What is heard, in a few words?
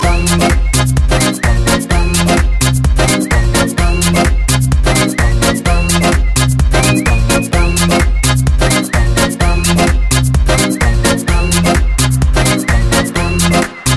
club love; jazz